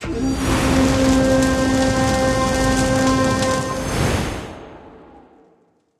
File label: bonus x3; games; Fenix Play 27 Deluxe; Wazdan